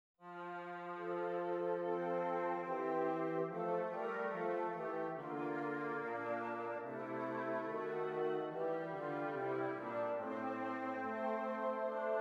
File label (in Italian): esercizi di doppio contrappunto nella decima; Ronge pagina 172; Originale Beethoven